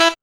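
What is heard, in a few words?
sax tone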